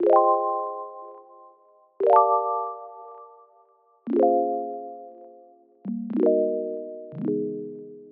Clavichords; Melody Loops; TRUTH-HZ; Crowdsourced Sample Pack

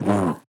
VOX; Sound FX; Crowdsourced Sample Pack

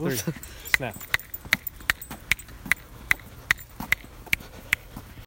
Snap, Foley; TRUTH-HZ; Crowdsourced Sample Pack

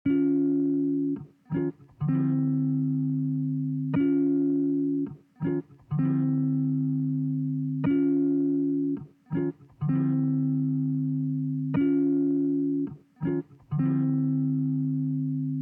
Guitar LOOP; Crowdsourced Sample Pack; TRUTH-HZ

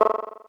menu error; Truth-Hz - 1-Hit KO Sample Pack

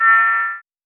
hyuee; Truth-Hz - 1-Hit KO Sample Pack

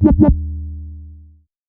wubs, Bass; Crowdsourced Sample Pack

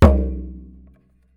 LowTom; Crowdsourced Sample Pack; TRUTH-HZ; Drum Kit; Percussion

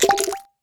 water drop perc; Crowdsourced Sample Pack; TRUTH-HZ; Drum Kit; Percussion

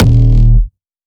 808 hard af; Crowdsourced Sample Pack; Drum Kit; 808s & Subs

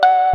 fartinson; TRUTH-HZ; Truth-Hz - 1-Hit KO Sample Pack

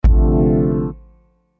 clay, Piano & Keys; Crowdsourced Sample Pack

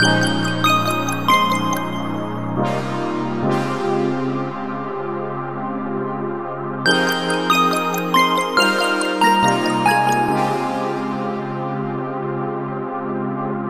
awake loop 140, Instrumental Loops; TRUTH-HZ; Crowdsourced Sample Pack

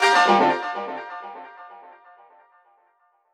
arp oneshot; Hits; Crowdsourced Sample Pack; TRUTH-HZ

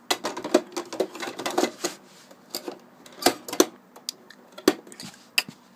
Insert Remove Game from NES; Captain Vita; Foley; TRUTH-HZ; Crowdsourced Sample Pack

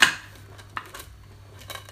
Slicing Pizza, Foley; TRUTH-HZ; Crowdsourced Sample Pack

